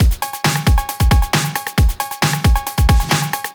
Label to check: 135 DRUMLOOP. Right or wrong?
Right